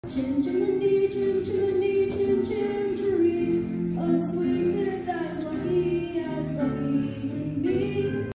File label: chorus